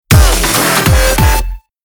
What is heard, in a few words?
Join Jingle; The Sound of Tekken